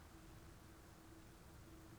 pulses, BALAENOPTERA; MONACO 2022-07

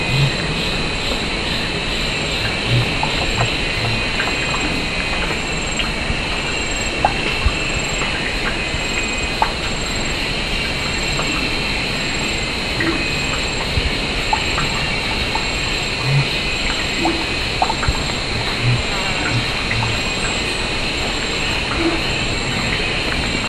bird

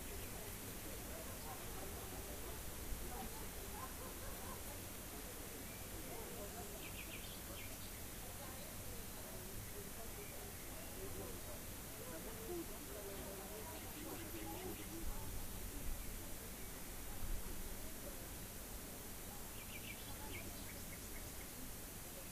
rayol farm; SEAMED

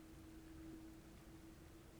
pulses, BALAENOPTERA; MONACO 2022-07

